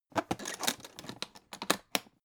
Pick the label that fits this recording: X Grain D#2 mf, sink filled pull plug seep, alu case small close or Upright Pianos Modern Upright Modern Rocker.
alu case small close